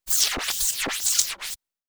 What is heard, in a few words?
Sci fi jerky tape